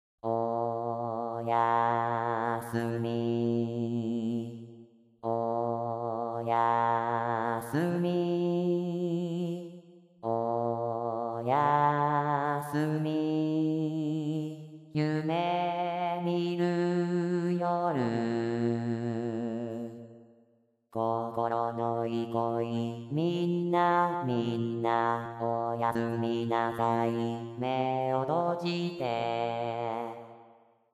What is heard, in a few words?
bass